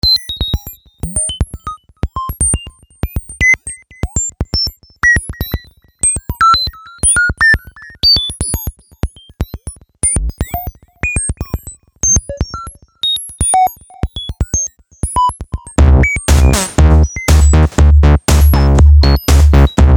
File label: DISCO DANCE